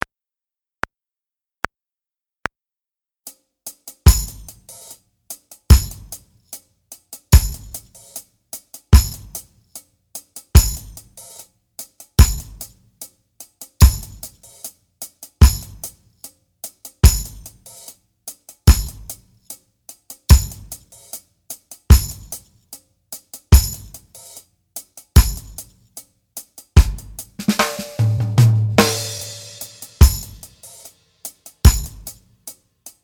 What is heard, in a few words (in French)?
Batterie 74 Bpm